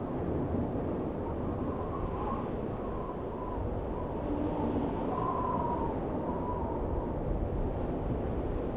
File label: cold wind